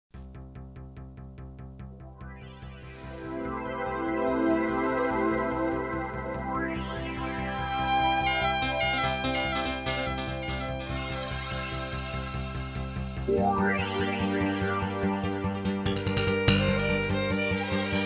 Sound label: Instrumental